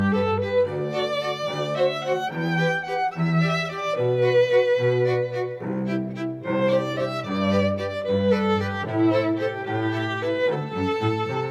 folk dance

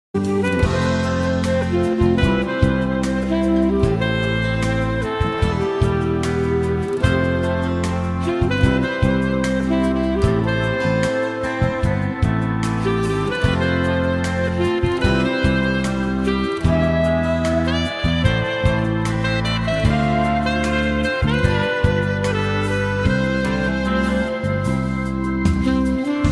lost saxophone

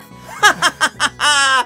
hahahahaha; hetalia

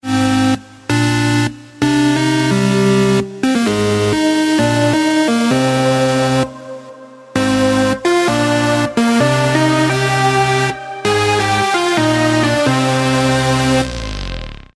ocarina; Wolfpack

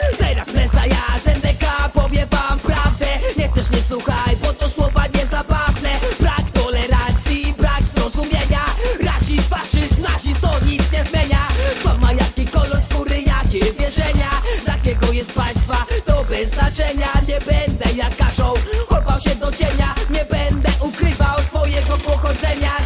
reggae

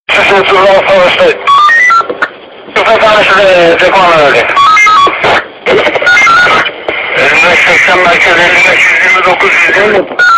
police algeria

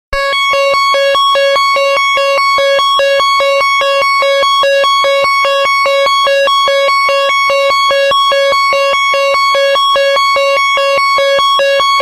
loud alarm

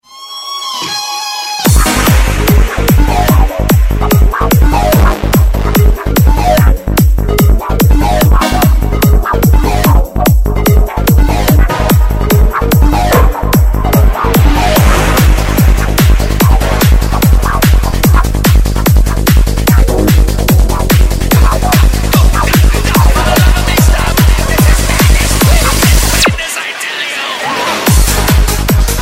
mettalic whip trance

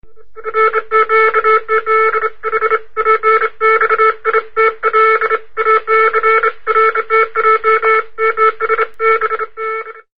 distress signal

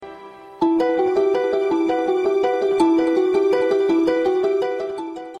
elegant ring, ringtone

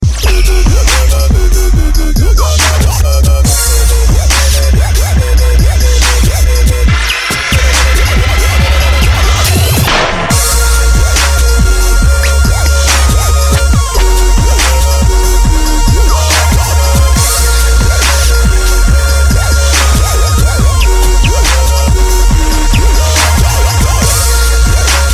dubstep ring

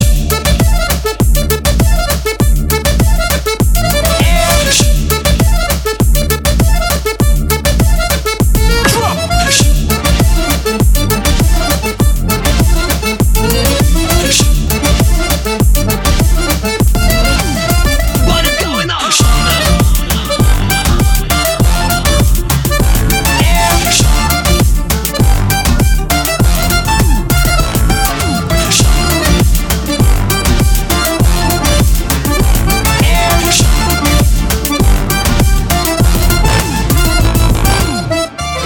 instrumental

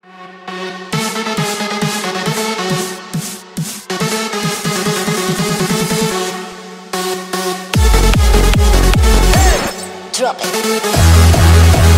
hard bounce instrumental remix; ringtone

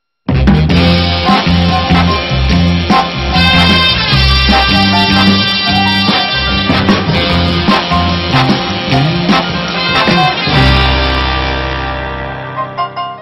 just funky

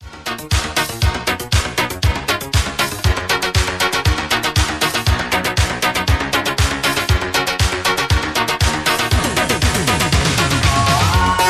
happy dance

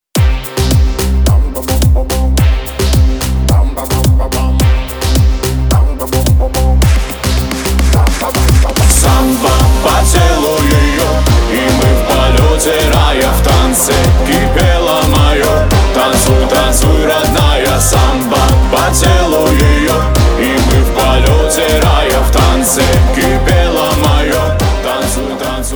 samba

